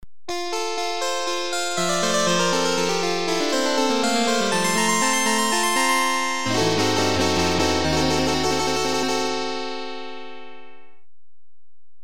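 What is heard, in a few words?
Electro Harpsichord; Zephyrus; Syntheway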